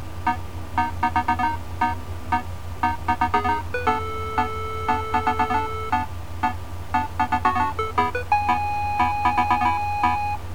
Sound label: tune; indy3mac